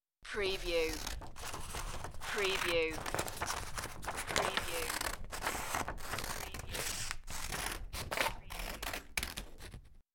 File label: Paper Cutting With Scissor